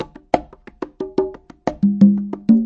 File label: 090 bongos, 090 bpm; Bazillions of Bongos